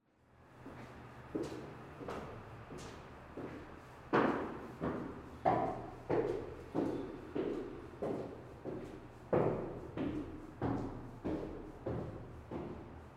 Tiếng bước chân giày cao gót xuống cầu thang

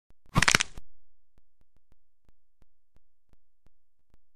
Tiếng bẻ khớp

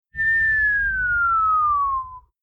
tieng huyt gio keo dai cham rai mot tieng hiu

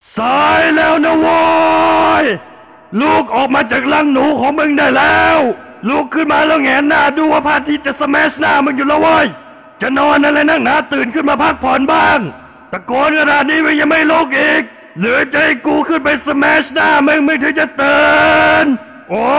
am thanh dong ho bao thuc thuc day hung han; เสียงนาฬิกาปลุก ปลุกแบบแอคเกรซซีฟฟึ; เสียงดังจังหวะเร้าใจ; umbrellakuns; ร่มคุง

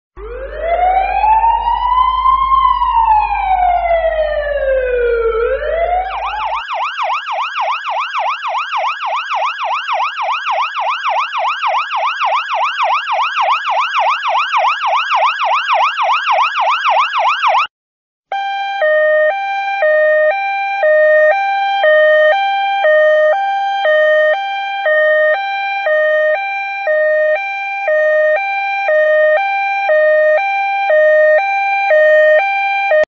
Tieng xe cap cuu chay tren duong pho, เสียงไซเรนรถพยาบาลที่กำลังวิ่งบนถน; เสียงรถพยาบาลวิ่งบนถนน

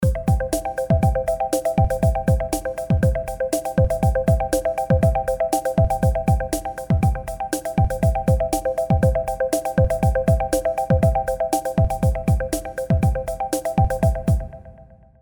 Nhạc nền bốc thăm trúng thưởng

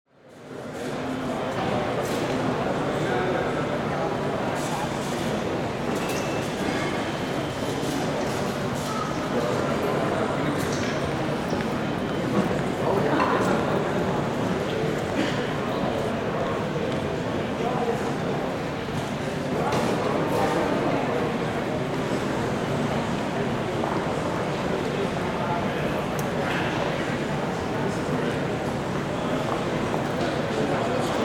am thanh moi truong on ao o san bay

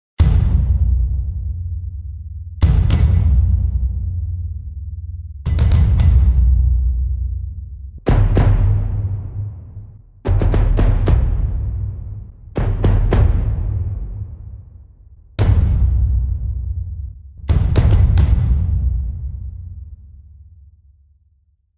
Âm thanh nhịp trống trong trailer phim

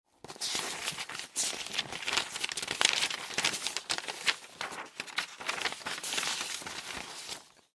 tieng xao giay to luc loi tim kiem giay to

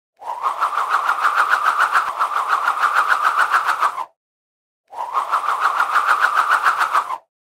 tieng xoay vong soi day thong long

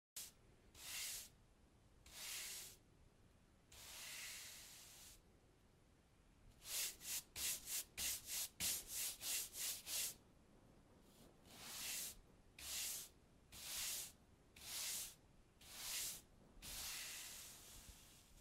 tieng quet nha bang choi

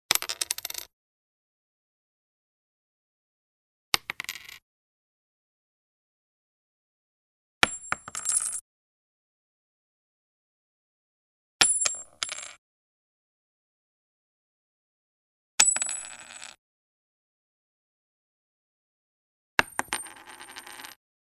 Tong hop tieng kim loai nho roi xuong dat

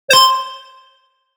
Am thanh tang diem thu hang khi choi tro choi

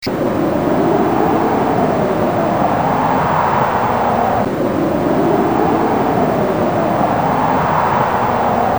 gio thoi

